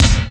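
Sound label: SP-12, drum machine; Best Service Gigapack 2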